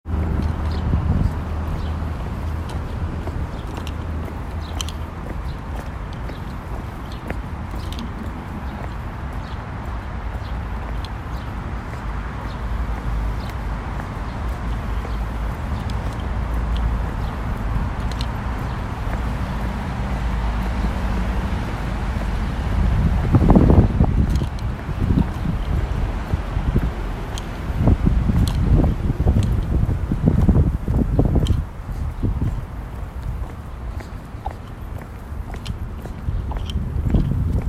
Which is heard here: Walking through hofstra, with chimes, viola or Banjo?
Walking through hofstra